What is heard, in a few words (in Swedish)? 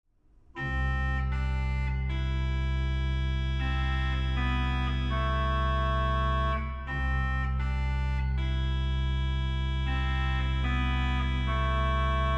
orgel; Spela orgel; Tredje flugan